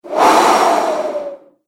Whoosh Breath; Whoosh Sound Effects; sound design

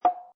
Would, Button sound or menu sound; Multimedia

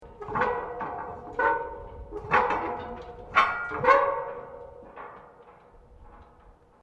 METAL CREAK LARGE; Materials

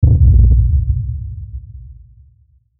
Hit Thunder Rumble, Hit Ring Shimmer Delay; Blastwave

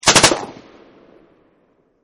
M2 Burst, M2 Machine Gun Burst; M249 Machine Gun Burst 1; Machine Guns; VRSonic

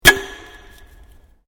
Can Aluminium Inside Crack; various metal cans; Cracking; Objects; SoundEffects-Foundation1

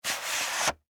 Vhs Cover Remove; Tape Players; Electronics

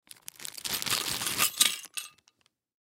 Mouse Trap Remove, Mouse Trap Remove From Package; Household; Machines; Blastwave

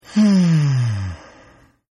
Groan Male; Groaning; Partners In Rhyme

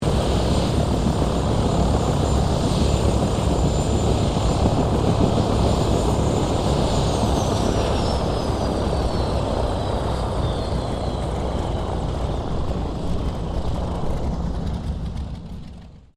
HELICOPTER BELL UH1 IROQUIOS SHUTDOWN; RAN UH-1 Iroguios; Helicopters (Sound Effects:Vehicles)

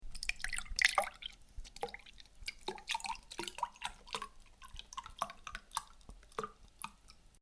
Pour drink, Drink: Pour Still Drink Into Glass; Bars; Miscellaneous Bar

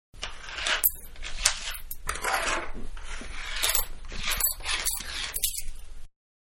Several Dragged Off Wood Table, Coin; Money; Objects

